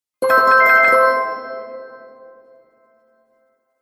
Game Magical Twinkle Effect, Video Game Sound Effects